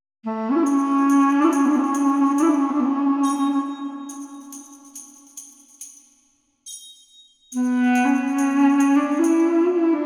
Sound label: no percussion; MIUI12